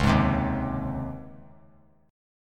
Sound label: C sharp Major 7th Suspended 2nd C sharp; strummed; chord; 13,15,15,13